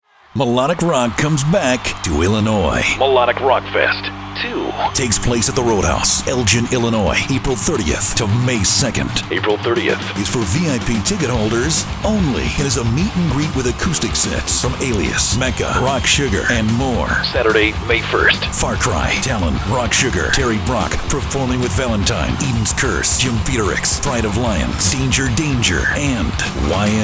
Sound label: Melodic Rockfest